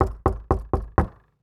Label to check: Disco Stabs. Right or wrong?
Wrong